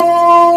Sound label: B3 Lo Dist Slow D#3